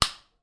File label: household light switch on with a little room reverb